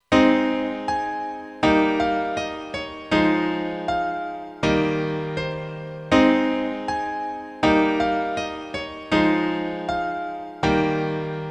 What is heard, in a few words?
piano score